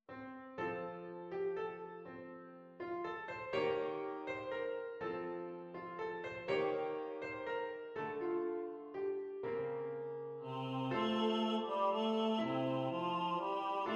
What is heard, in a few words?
If I Was A Blackbird BASS